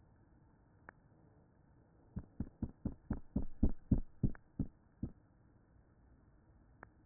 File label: thud sounds, fish